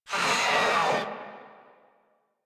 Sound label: Kavat Vocal Death; Warframe; Khora